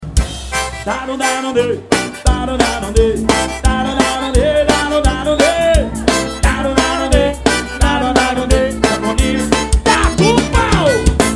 dance balance forro; Manaus AM

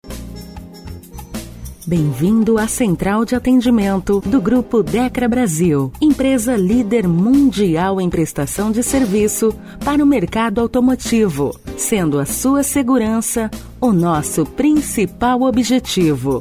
espera telefonica; Dekra

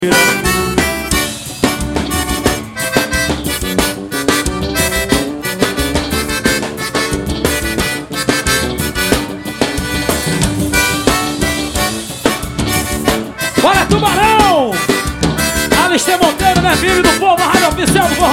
forro; Manaus AM

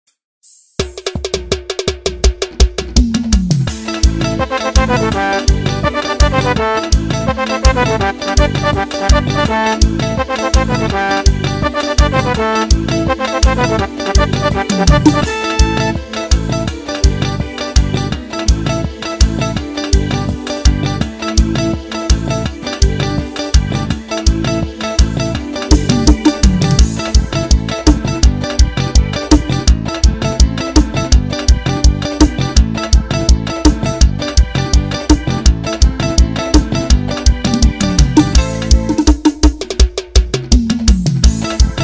eletrico playback karaoke

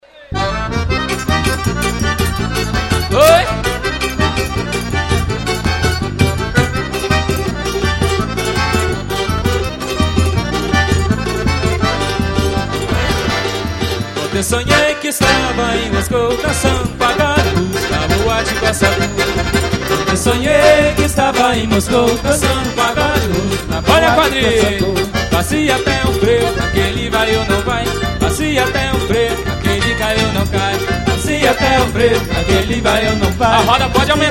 Forró

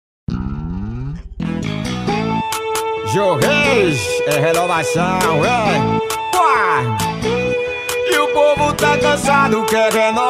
jingle vereador prefeito eleicao campanha; perna bamba; politica

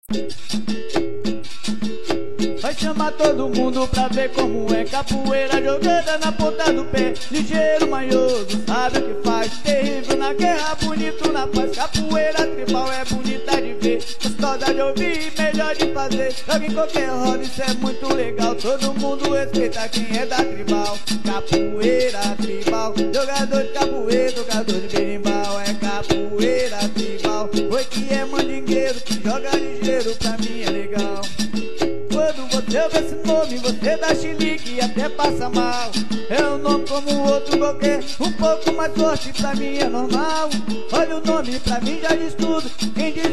capoeira; capoeira tribal

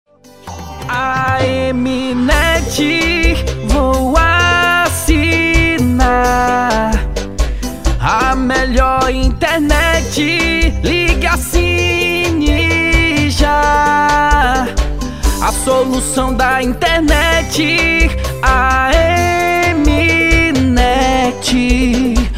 jingles para internet; Musica Para Provedor De Internet; Jingles Politicos

